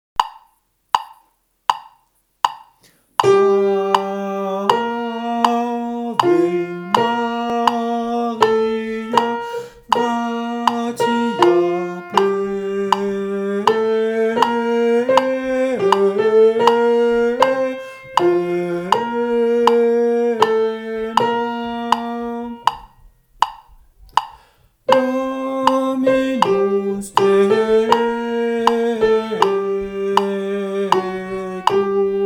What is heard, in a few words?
ave maria soprano